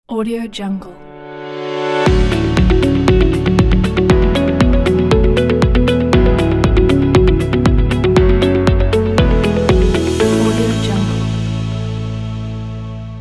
logo reveal

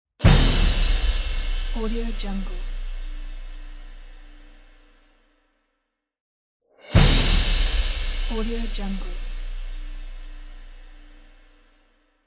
orchestral cymbal hit

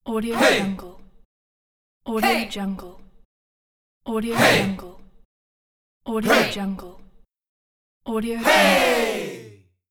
a hey

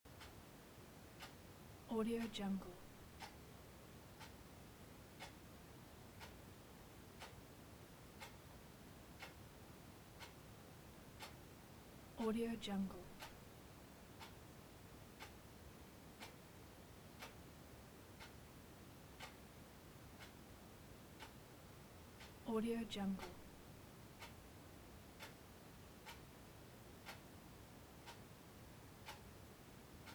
room tone ambience clock ticking

